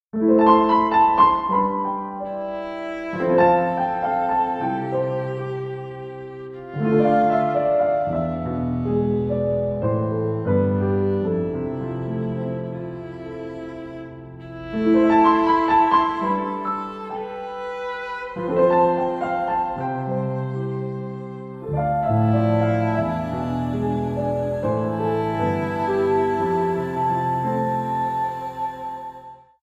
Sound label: Piano